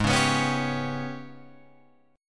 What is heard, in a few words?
Ab Minor Major 7th Double Flat 5th, Guitar, Open F, AbmM7bb5 chord; strummed